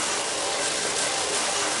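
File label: plasma grenade loop, plasma projectile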